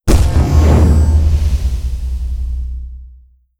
neutron hit layer; apocalypse

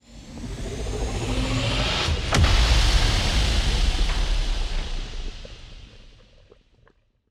toxic megastructure hit, weapons; Stellaris; toxoids

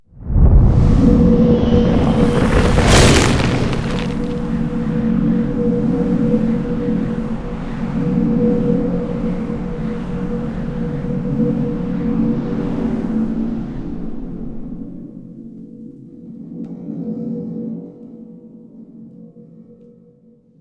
organism animation; precursor event; baol

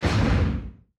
distance kinectic artillery fire; weapons; Stellaris

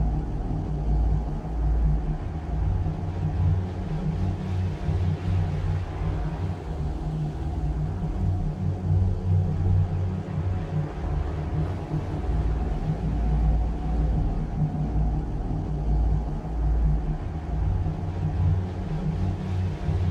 ship construction ship idle; Stellaris; machineage; ships

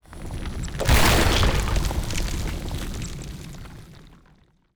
ice cracking; Stellaris; strange worlds

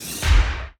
ftl hyperlane out coming ship; ambient; Stellaris

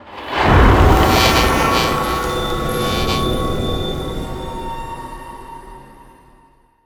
world relic show planet; ancient relics

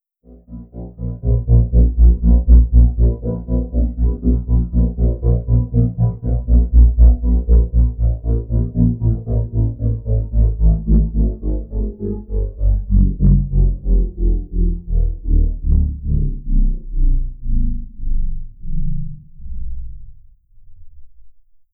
molluscoid colossus wind down; apocalypse

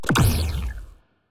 weapon debuff hit; Stellaris; biogenesis; weapons

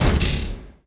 robot pops move, ui; Stellaris

